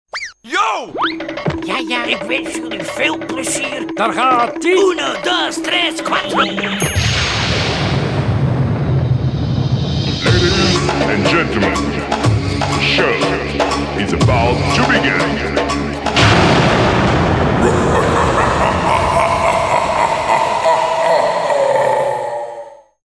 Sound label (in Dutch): SHOW BEGIN THUNDER LAUGH JINGLE, kermis geluiden